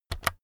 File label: keyboard external key press fast